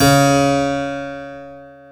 KEY HRPCR, Roland; Roland - LA Composer vol. 1

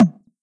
Percs, Elektron MachineDrum; SPS1 MKII

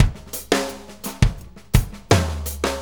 Drum Tools, Loops; No Small Feat; Sonic Foundry (Sony Creative Software); Various Loops

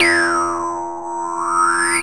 SIP, Anime Sound FX; COMIC

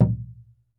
PUEBLO, American Natives, Earth Tones; E-MU Formula 4000 Series Vol. 4

